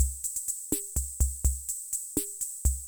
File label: Loop, classic machines; arranger